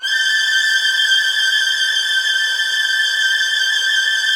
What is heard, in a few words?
WH TR G6, Violin; Q Up Arts; Denny Jaeger Master Violin Library; PERFORMANCE